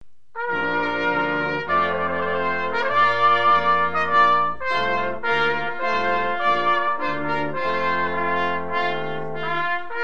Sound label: Trumpet Voluntary